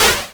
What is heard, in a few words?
Perc Hit, Noise